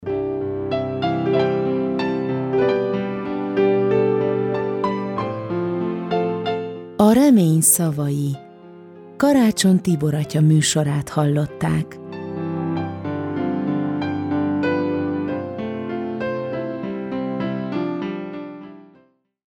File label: JINGLE; A remeny szavai